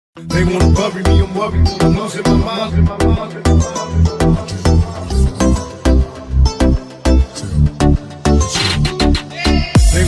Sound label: gangsta remix